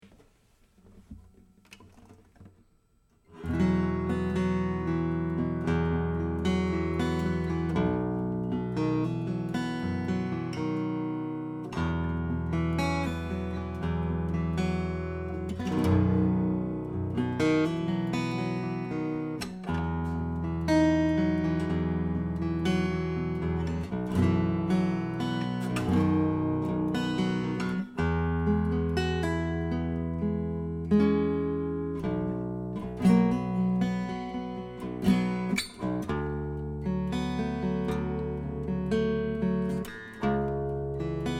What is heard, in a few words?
baritone, guitars